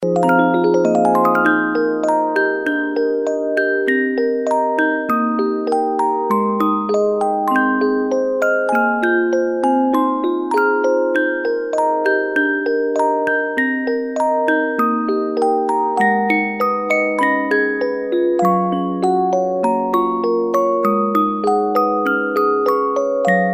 musicbox; yamadas; children

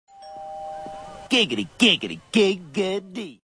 door bell

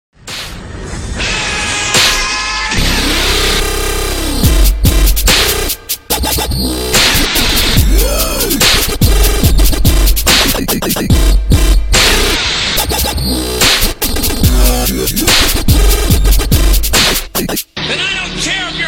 techno step

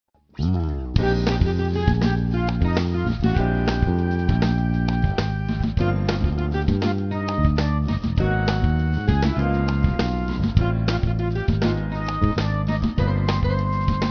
Flute Samba

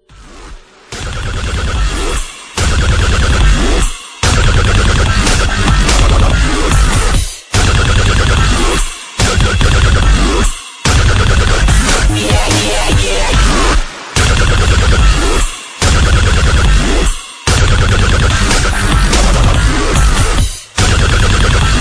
guided relaxation dub

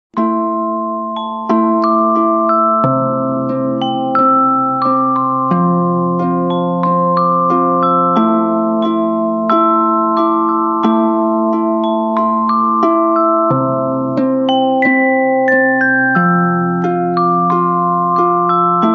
soft romantic, ringtones

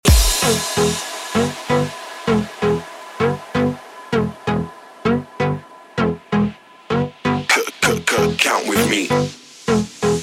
sweep, ringtones electronic; rolex

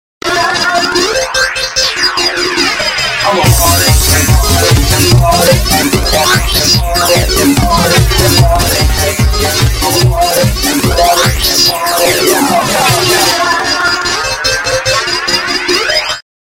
party metal

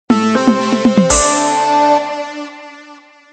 notification

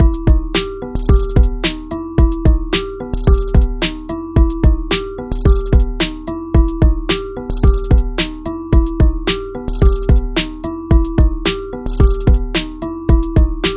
Beat Ringtone

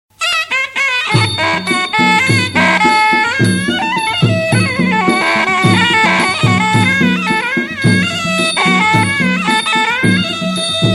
thai boxing bgm; ringtones

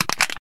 Crash can

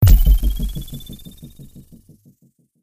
Pulse Scan; The Division

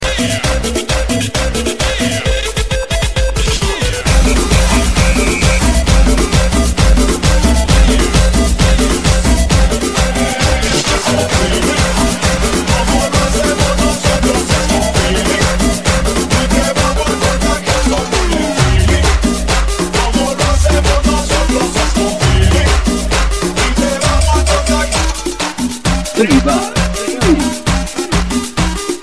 tribal mix, ringtones latino

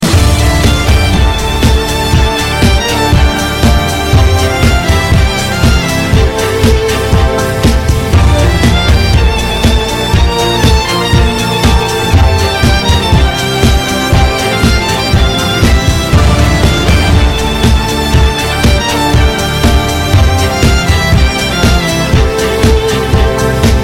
so romantic ringtone

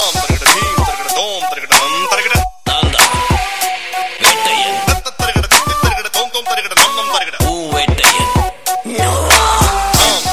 tamil cool mix; ringtones; sms

